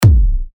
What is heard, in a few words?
Kick D#; techno kicks; revealed techno kicks vol 3; Moonfall